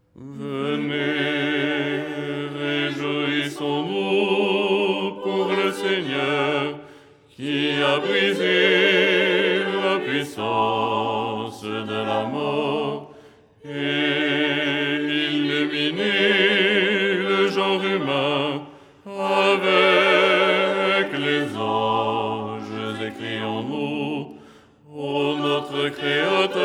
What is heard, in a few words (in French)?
basse; Au lucernaire, 1ère stichère du dimanche; Les 8 tons en français